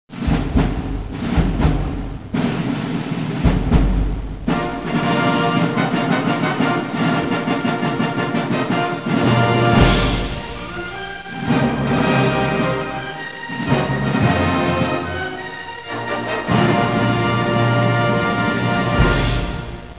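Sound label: Fanfare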